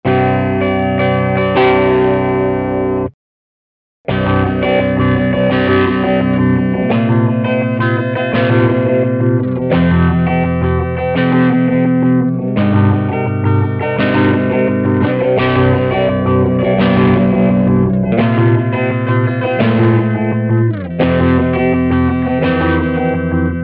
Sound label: phaser; mobius